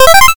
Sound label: power up